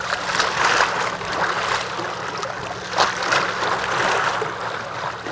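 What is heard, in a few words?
water loop; Splashdown; XBOX; Soultree; Barrier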